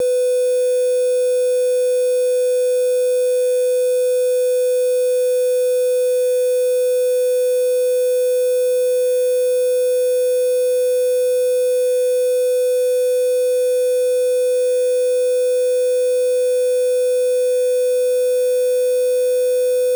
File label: 16 bit no dither; Pro Tools; L2 at 16 bit setting with no dither. Note the extreme amounts of harmonic distortion present in signal.